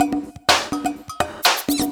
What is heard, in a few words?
DRM, LOOPS 125, House